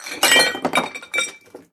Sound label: dishes clattering; DeadFright